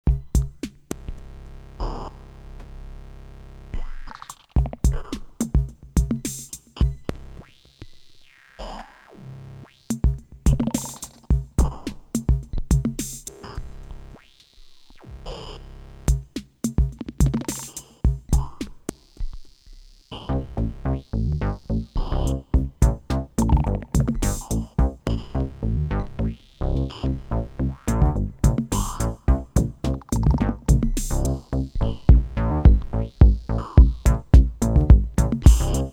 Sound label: technoise coffeemachine